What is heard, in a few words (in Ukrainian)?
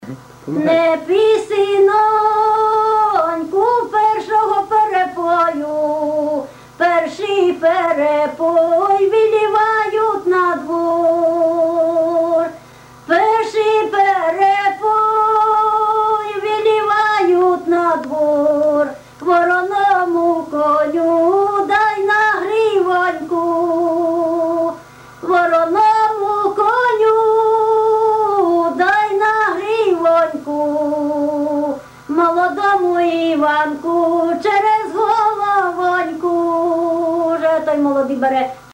pisnja; Ne pyj synon ku vesil na